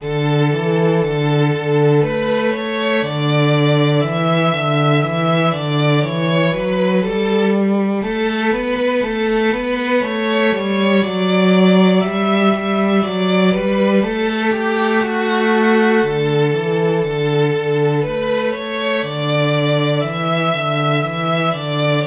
backing track fast violin and cello